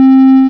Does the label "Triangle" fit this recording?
Yes